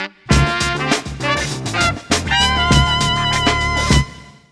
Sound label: Hornzy, Fanfare; 1997 MMX LAN PACK (win 95); Worms Armageddon